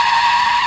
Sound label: Tire Squeal; Working Model Program